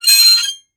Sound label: Horror Scary Metal Screech